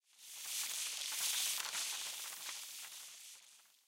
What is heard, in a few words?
flock medium oneshot